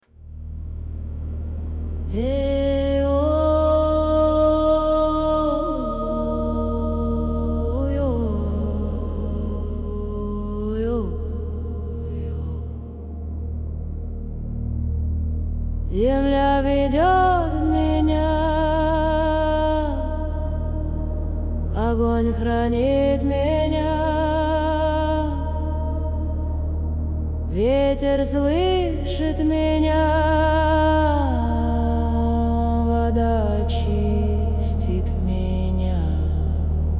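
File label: shamanic mantra; mantry affirmacii; shamanskaya mantra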